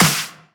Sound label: normal hitclap; osu; hitsounds; deadcodehs